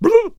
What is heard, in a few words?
Bard Attack Vocals